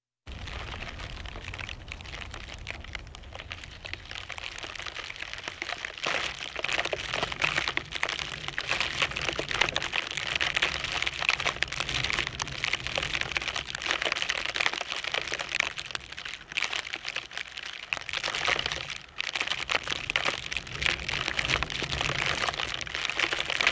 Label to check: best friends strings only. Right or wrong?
Wrong